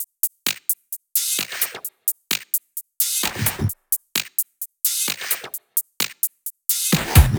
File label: 130BPM Jig Kit; Vengeance Samples; DK Jig Kit